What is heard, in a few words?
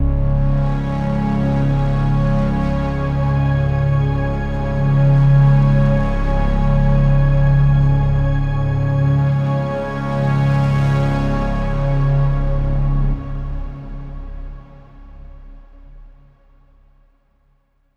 Overlay Drone